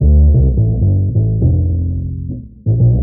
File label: bass, Loops